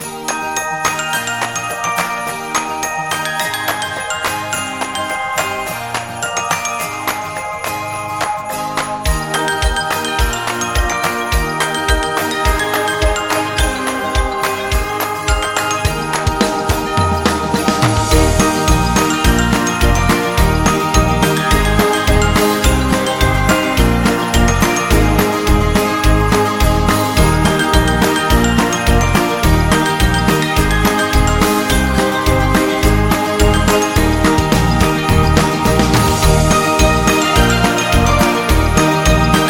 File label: Happy Upbeat Xmas